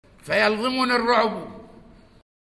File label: loop